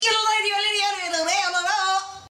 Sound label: climb jodel